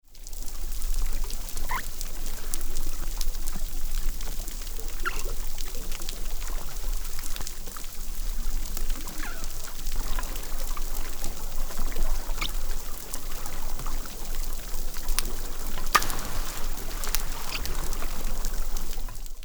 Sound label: dugong calls